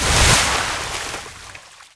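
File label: water out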